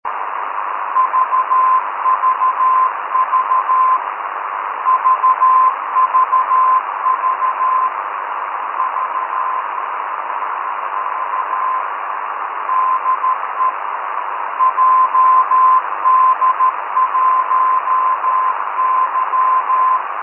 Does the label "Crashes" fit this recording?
No